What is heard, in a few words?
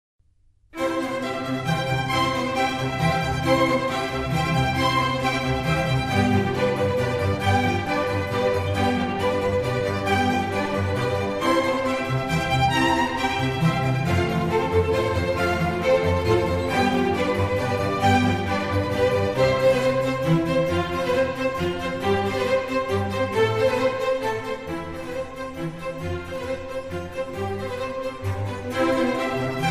Concerto for Strings in C major; RV110; Antonio Vivaldi